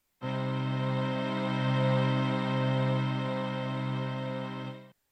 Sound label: tonic c minor